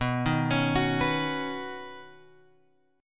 B Major Add 9th B, chord; Badd9 Chord {7 6 X 6 7 7} arpeggiated

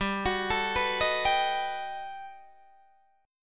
G Augmented Major 9th, Guitar, Open D

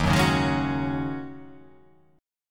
D sharp Minor 11th D sharp, chord

